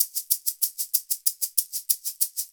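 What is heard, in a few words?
shaker egg double, percussion; ILIO; Synclavier Percussion 2; World & Orchestral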